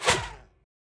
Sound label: Woosh dry; افکت صوتی